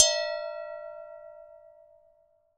Strike, Vase

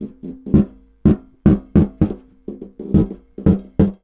Snare Drum; Percussion Samples

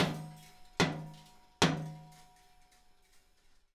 Hitting, Workshops

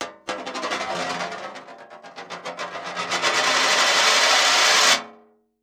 Coin On Metal Tray

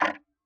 Tick, Clocks Kitchen Clock

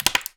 Palette Breakage, Wooden Palette

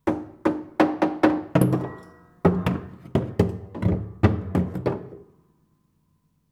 Body Thump Dense, Piano Destruction

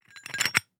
Hole Saw Rattle, Hole Saw Bits

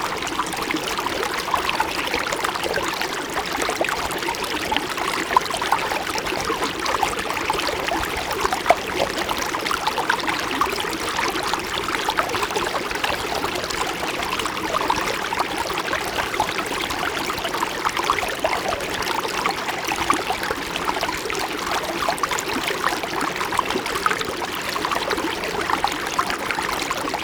River, Static Microphone

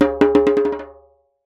Jembe Bounce; Percussion Samples

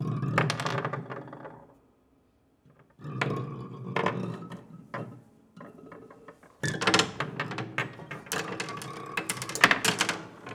Marble Roll Rattle, Piano Destruction

